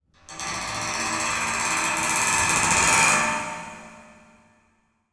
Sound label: foley metal scrape coil spring industrial; zapsplat